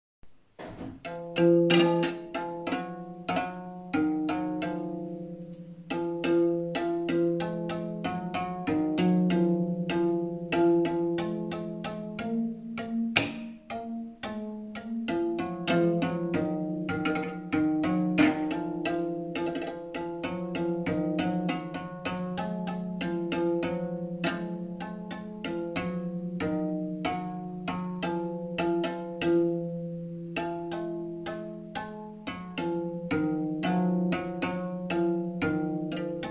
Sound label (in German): solo geschlagen, harfe; musikarchaeologie